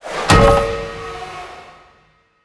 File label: sign BONK